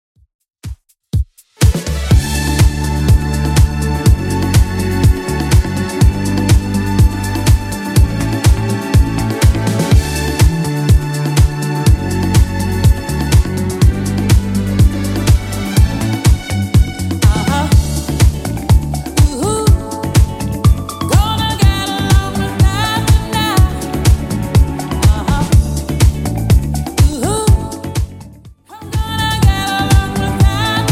70s Redrum 123bpm CLEAN

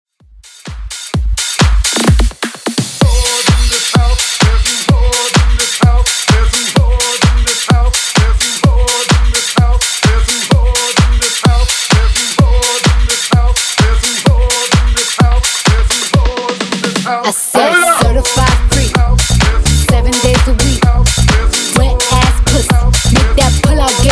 Bootleg House 128bpm

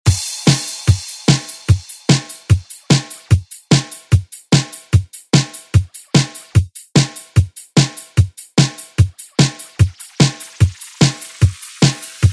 80s Re Drum

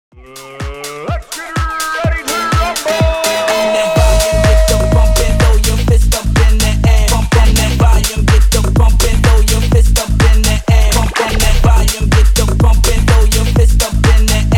HYPE 125 BPM

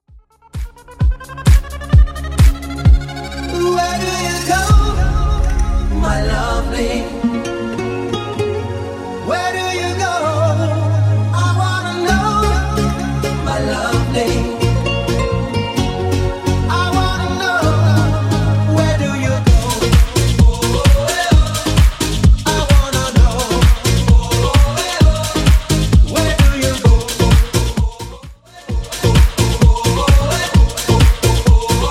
90s DJ Friendly Redrum 130bpm

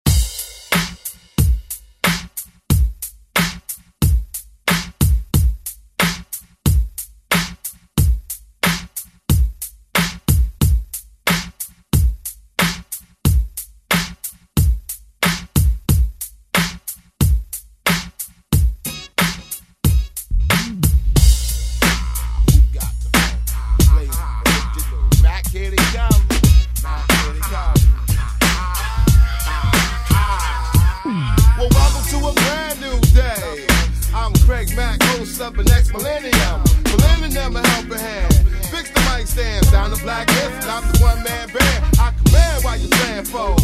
90s ReDrum; DJ Mhark

